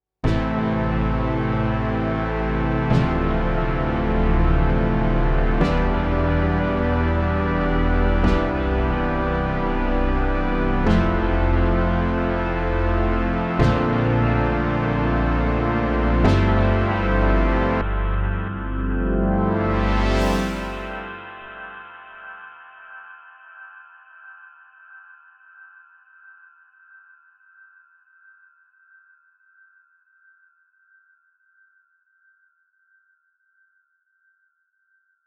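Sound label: Moog Synth Stereo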